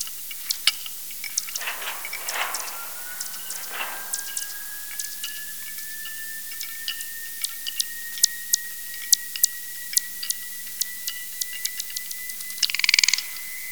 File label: bat; Bats' Acoustic Blindness: An internet audiovisual illustration